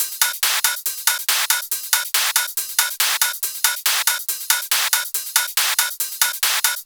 Loops 140 BPM Hihat Percussion Loops

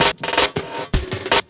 LOOP SD, 160 BPM

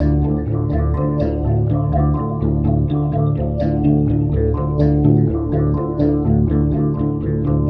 Guitar Textures

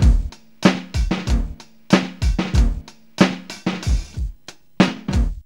KETTLEDRUM 94, Funky Elements LOOPS; Zero-G

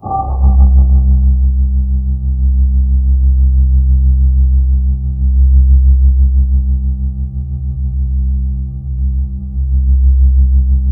C1, Synth Line; 90 s Sample CDs; NiceBeats; SynthLine VOL-32

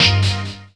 chords

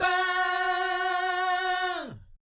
VIB FX, Jazz FX; Spectrasonics; Vocal Planet; JAZZCOMBO

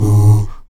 VOX AHOO, Afro Chants, Stacc Ah Oos

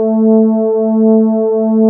PD DARK, Nord, LEAD PAD HRN; Wizoo - Powered Nord

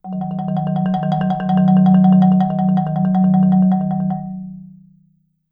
F#2, Marimba roll; Sampleheads - Dave Samuels Marimba & Vibes

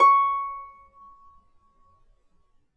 DB5 HARP; USB Soundscan vol.62; AKAI

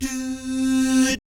DOO, Vocal Planet Jazz; ILIO; Jazz & FX; JAZZCOMBO

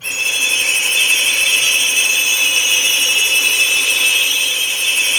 STR FX, HORROR; East West - Global Sounds

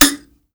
MBIRA C#4; BS MBIRA2; Heart of Africa

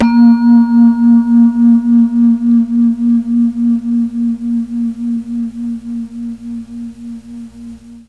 A#1 VIBES, Mellotron MKII; Mike Pinder - Mellotron Archive CD

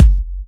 Trance Kicks

